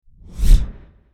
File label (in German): klick; mercedes